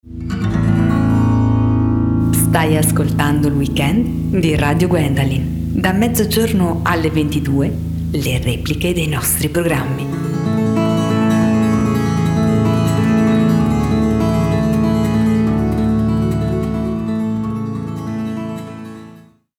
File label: Jingle; Weekend Repliche